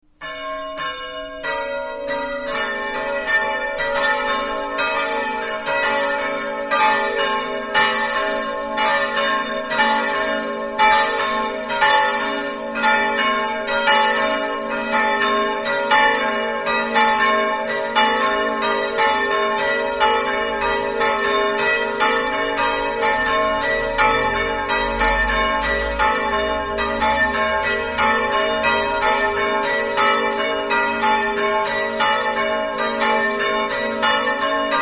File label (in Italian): campane